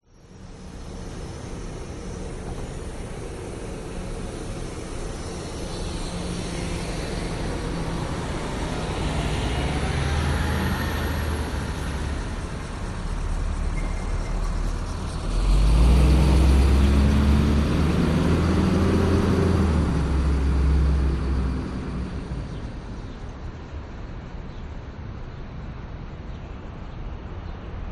Traffic Intersection USA Atlanta Quiet Streets Binaural